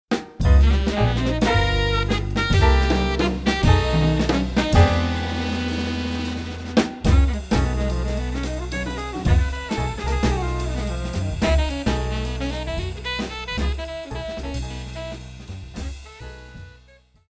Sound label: jazz